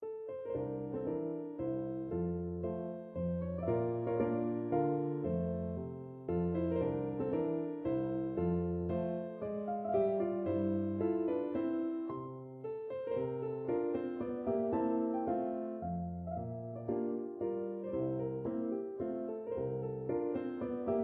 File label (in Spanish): mazurka en fa mayor